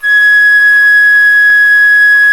flute, C flute

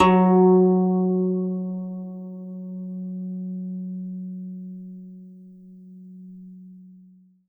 zheng f#2, ZHENG HARP; World Instruments 2; STRINGED INSTRUMENTS

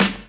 SD, snares; EastWest; Dance Mega Hip Hop Electro

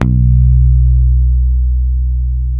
PICK B 2, Bass, ROCK PICK; Spectrasonics; Bass Legends

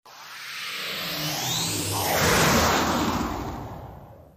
wooosh, synths hits

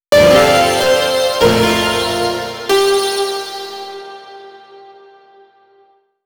chord

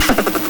VOX LAUGH; NES DPCM; MIDINES

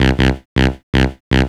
gro 160, Hardcore Loops, Bass Loops; Dance Synths

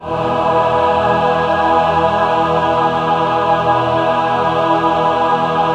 BIG MAJ E4 R, BIG CHORD, Symphony of Voices

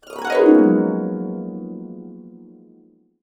Gb MAJ, Harps; USB Soundscan vol.62; Complete Harps; AKAI

